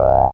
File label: FILTR BASS